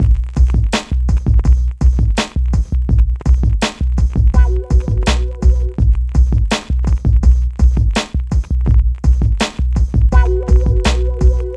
PHATT BEA

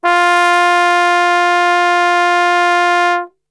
F 2 Brass Super Section; Best Service; TRMBSTRGHT; 90 s Sample CDs

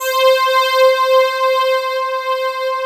pole pad C5; NORTH PAD

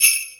Sleigh Bell; EMU PX-7

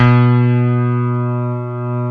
CP70 E4, YAMA.CP70; East West; Global Sounds

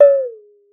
water; Xsample VOL-9

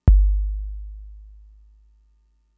Kick, Drum Machines Fricke MFB-522

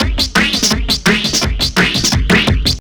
Whisper 170, Drum&Bass Breaks; EZ Rollers

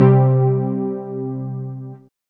SYN, Analog

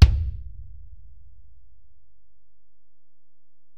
Ac BD, Alesis DM-PRO; 300 Drum Machines

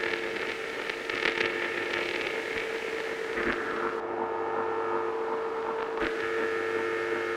Filtered Noise, radio signals

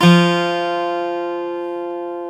GTR, Dual Guitar, Ac 12+; Club-50 - Foundations

